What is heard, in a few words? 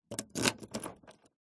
vehicles car key insert lock ext 4x4; zapsplat